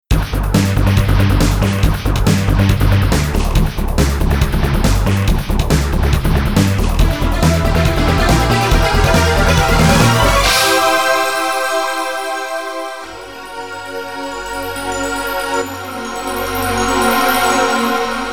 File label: End Level; Descent; SoniqBear